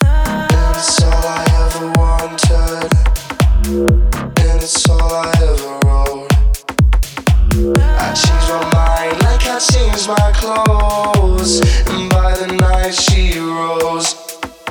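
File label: BASS CLUB car audio loud front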